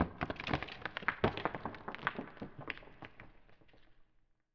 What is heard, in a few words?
rocks; The Elder Scrolls III - Morrowind